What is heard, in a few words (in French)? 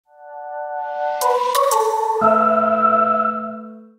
Signature sonore Audio Logo; SFR